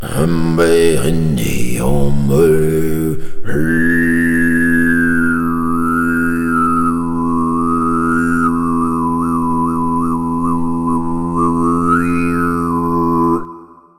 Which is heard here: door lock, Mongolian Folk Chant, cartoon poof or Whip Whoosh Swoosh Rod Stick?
Mongolian Folk Chant